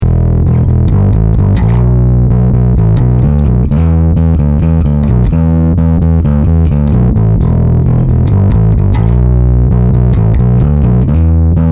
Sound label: 6 Finger Gain Full